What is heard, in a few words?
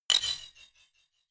drumstick hit clank hard